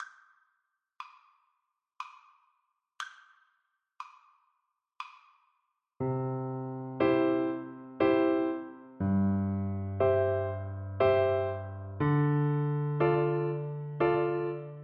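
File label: Waltz in A VLC karaoke, Cello; Schubert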